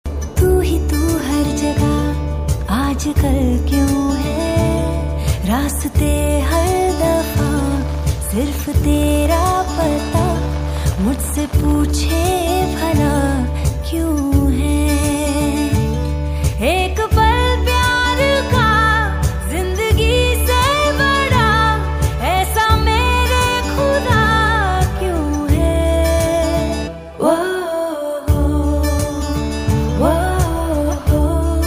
female hindi ringtone

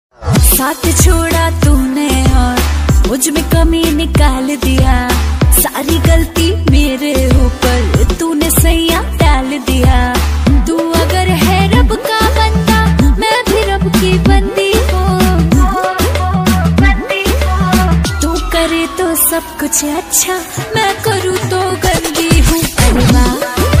bhojpuri ringtone

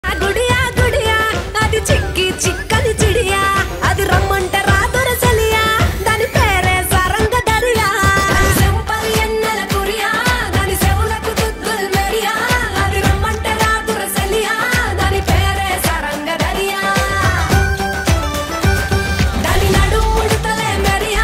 tamil ringtone

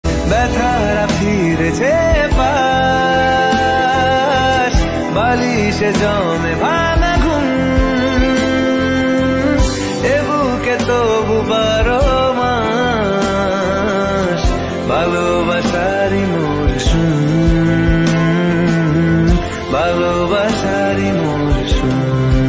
male bengali ringtone

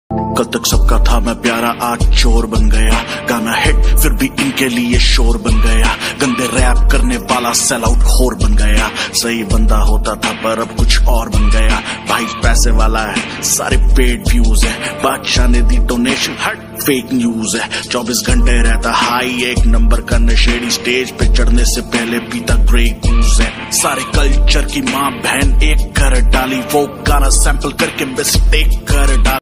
rap ringtone

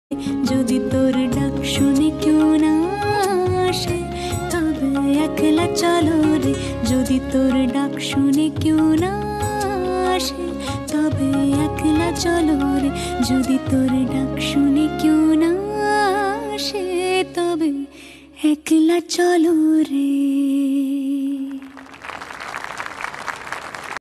female bengali ringtone

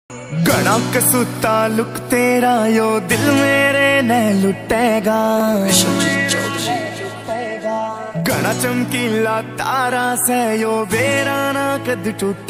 haryanvi ringtone

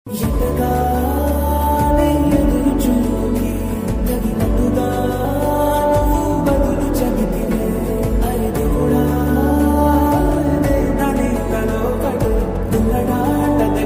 slow reverb tamil ringtone

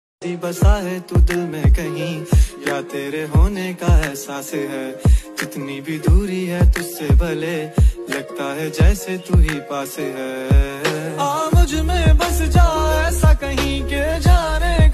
hindi rap ringtone

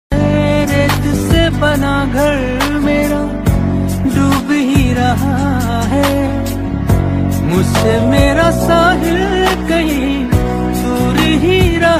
pakistani ringtone

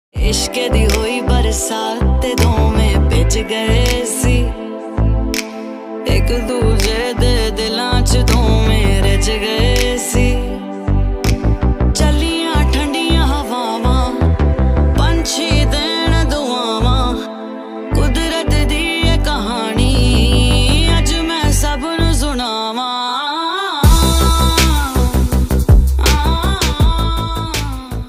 punjabi ringtone

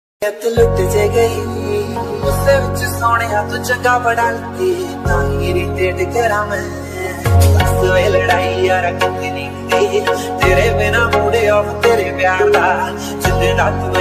Panjabi mashup remix, panjabi ringtone

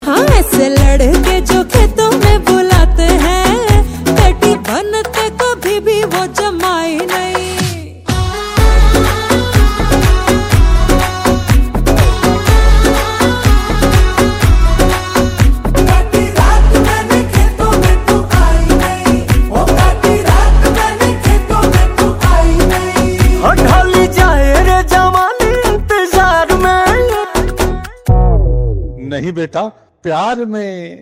hindi ringtone

